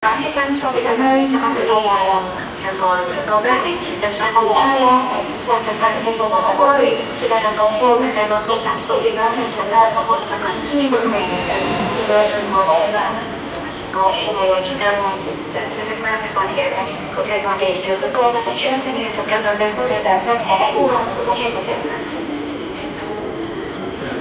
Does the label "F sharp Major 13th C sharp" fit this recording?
No